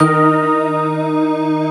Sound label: Melody Shot D#, dubstep; One-Shots; Melodies; Murk; Ghosthack; Dubstep Sounds 2025